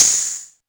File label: hat; Quasimidi Technox